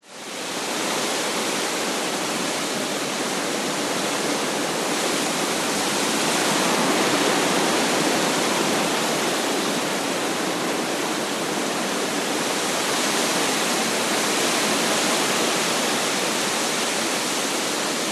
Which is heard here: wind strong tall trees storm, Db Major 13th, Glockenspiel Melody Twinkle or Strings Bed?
wind strong tall trees storm